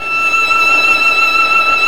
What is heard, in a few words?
STR Violin, Solo Violin FX